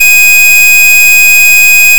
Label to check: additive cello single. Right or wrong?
Right